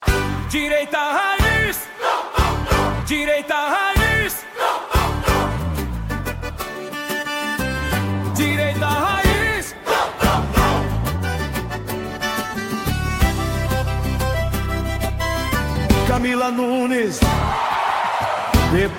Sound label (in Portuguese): jingle